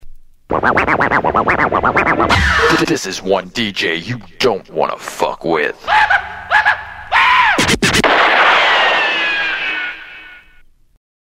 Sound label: Acapella; DJ Tools